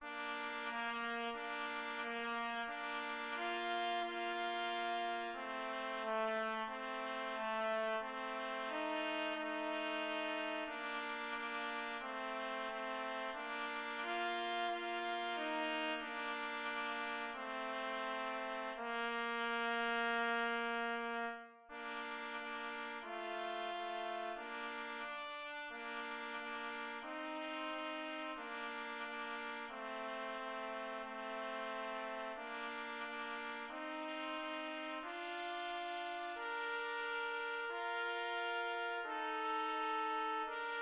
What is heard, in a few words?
beginning trumpet duet in c major